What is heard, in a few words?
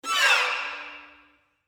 short gliss down